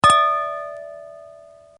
Glass Wine Glasses In Toast; Glass (Sound Effects:Materials)

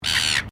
Bird Silver Gull Chirp, Australian birds

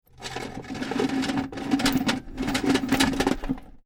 Drag Chain Chain Scrapes On Metal; Chain Sounds; Metal Sound Effects

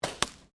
GUN TOY DART GLASS HIT; Objects

